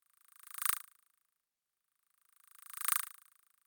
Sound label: Drippy